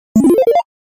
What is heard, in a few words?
cute SMS alert tones ringtone